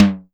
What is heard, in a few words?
SIMMONS SD-5 drums